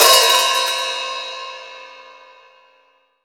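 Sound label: MIX open hi-hat, OLD HH; AKAI S-Series CD-ROM Sound Library VOL-3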